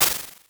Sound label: delete